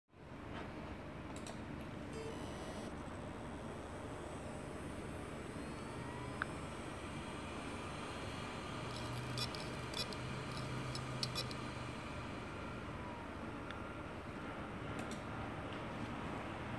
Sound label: HEADS SEAGATE 3TB 3.5 SPIN DOWN, damaged hdd sounds